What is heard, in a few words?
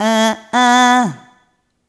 Rhythmic A